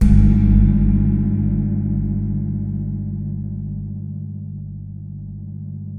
TAM T; Xsample VOL-9

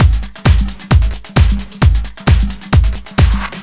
Drums, 132bpm, Nu Dance Electro; Zero-G; Soundclash; Combi Loops

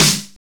snare E; Greytsounds; ADD-ONE KITS

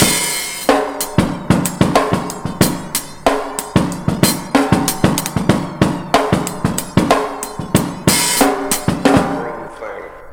DRM, Breakbeat

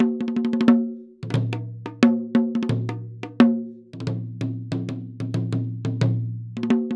PERCS 90BPM; INIS - Opium

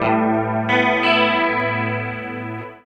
GUIT; East West - Phat & Phunky

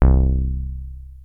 Access Virus B; Synthworx

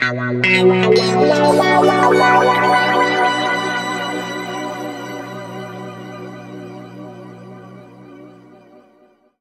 B P-S WA -R, Guitar; East West; Steve Stevens Guitar Samples Collection; PTCH-SHFT+WA

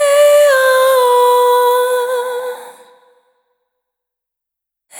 Single Voice Melodic Loops; Sony Creative Software; Pocket Diva World Class Vocal Samples; Discovery

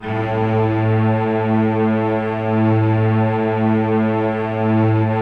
lush strings, Lush Octave; Optical Media International; Sonic Images Library

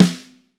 HHT, Vintage Snare Drum; Q Up Arts; Johnny.C's Vintage Snare Drum; 6.5X14 GRT

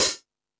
Metal Drums, E-MU Pro-Cussion; drum machine

